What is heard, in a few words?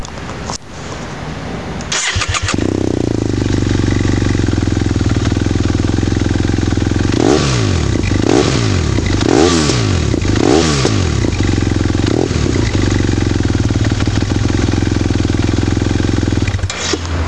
BMW f800s akrapovic, moto